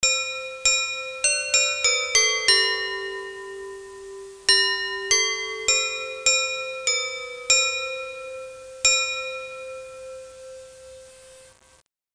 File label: chime; Santa's Workshop - Advent Calendar for Computers